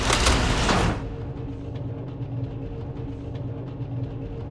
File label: spin Start; eggomatic